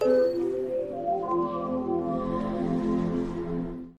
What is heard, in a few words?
Synth Start Up; Humans